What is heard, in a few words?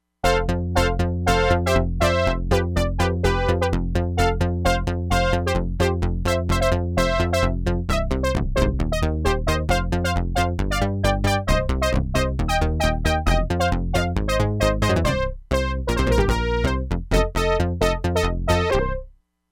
SEQUENCER BASS - FAST BRASS, Crumar Bit 99; Factory Programs